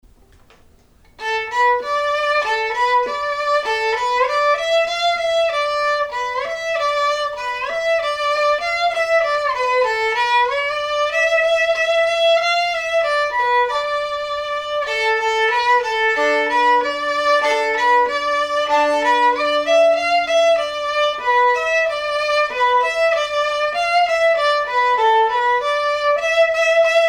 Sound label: viola simple blues in d